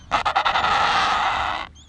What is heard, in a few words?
Adelie Adult, Penguin Sounds; Antarctica; Dargaud, Guillaume